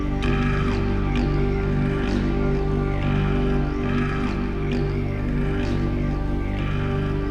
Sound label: Cue Loop; Kill Her Killer; Warframe; NineteenNinetyNine; Stage8ShitboxConvoy